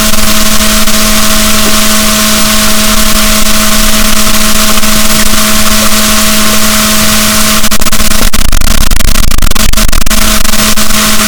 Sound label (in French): rorquals, boat, Balaenoptera Physalus; SNR 12dB